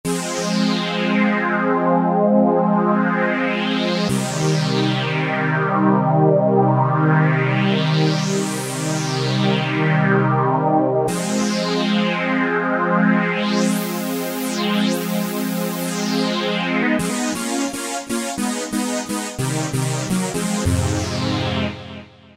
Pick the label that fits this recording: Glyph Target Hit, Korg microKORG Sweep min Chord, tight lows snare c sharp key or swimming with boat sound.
Korg microKORG Sweep min Chord